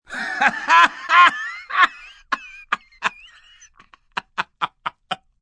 Laugh Wheezy, Laugh, male, short outburst (human voice); Laughter Sound Effects; Sound Effects:Voice; VoiceBox